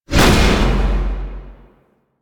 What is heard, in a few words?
Bash Cast Release; Warframe; Warframes; Hoplite